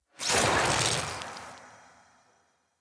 Wave Power Cast

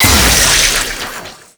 Blast Cast; Warframe; Captain Vor; Abilities Redux

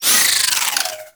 Turret Throw Whoosh; Warframe; Corpus; Corpus Railjack; Crp Admiral Agent

